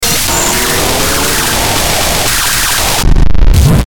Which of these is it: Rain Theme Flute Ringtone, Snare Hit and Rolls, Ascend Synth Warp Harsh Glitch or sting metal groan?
Ascend Synth Warp Harsh Glitch